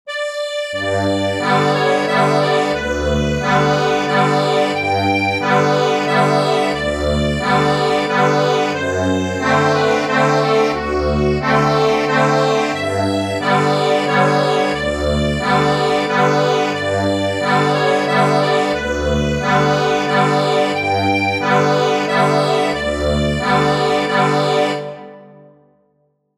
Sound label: Waltz ACCORDION; Guaracha